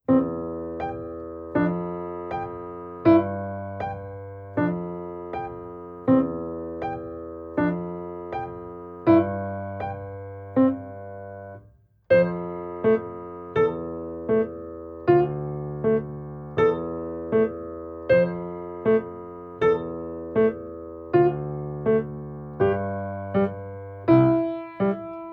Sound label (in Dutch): Sprongen van noot naar noot, Piano oefeningen